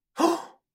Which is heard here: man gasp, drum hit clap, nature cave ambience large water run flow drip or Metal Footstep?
man gasp